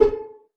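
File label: pizzicato D#2 PP, STRING PIZZ; Sound On Sound - Peter Siedleczek's Orchestra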